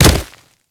Kubrow Land Dirt, Footsteps; Enemies; Warframe

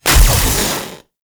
Gun Shot; Warframe; Weapons; Thano Tech Arch Gun

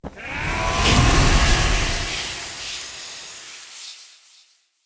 Soul Steal Burst; Jotar

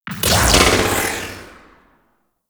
Tail, Weapons; Crp BFG

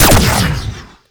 Fire Shot; Duviri; Incarnon Cestra; Weapons

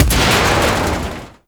Fan Explosion; Warframe; Corpus Gas City; Gameplay Remaster; Ambience

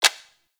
UI Menu Scroll; Warframe; Caliber Chicks Minigame; Title Menu

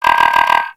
Puzzle Input Incorrect; Ambience; Warframe; Corpus Gas City; Gameplay Remaster

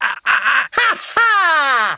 Lift Laugh; Warframe; Corpus Lich; Persona A

